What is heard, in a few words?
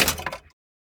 Click; Lewd SFX